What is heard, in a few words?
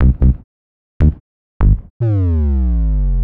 Bass, Futurist Drum & Bass